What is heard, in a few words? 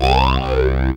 SYN C 3; Best Service Gigapack 1